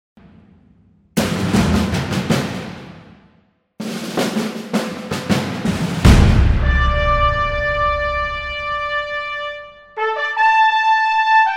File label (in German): Fanfare in 3 Variationen